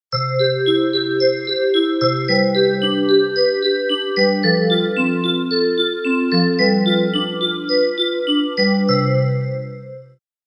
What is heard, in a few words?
Marimba rev chor